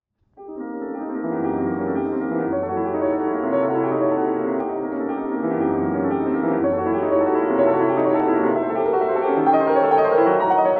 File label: Studio for piano; Studio 1